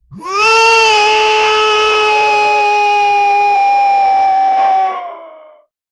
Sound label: fluffy cartoon monster